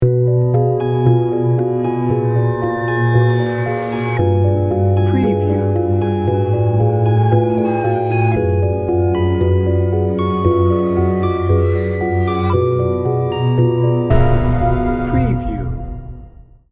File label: haunted